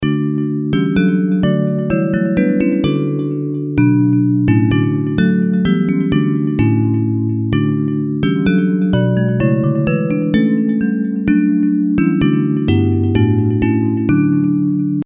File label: Bells, Traditional hymn